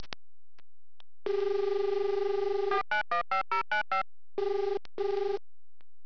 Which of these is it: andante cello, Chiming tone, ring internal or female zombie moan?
ring internal